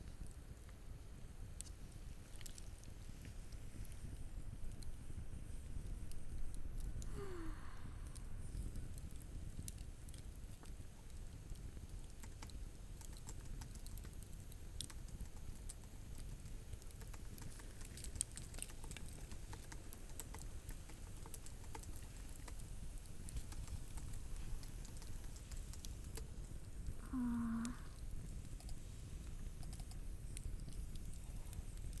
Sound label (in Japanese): PC作業をする＆焚き火の音 セリフなし